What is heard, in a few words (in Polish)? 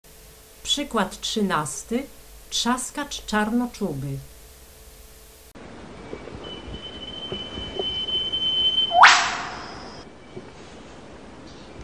trzaskacz czarnoczuby, ptaki